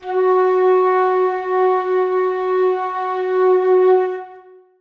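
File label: flutes sustain f#3; Sonatina Symphonic Orchestra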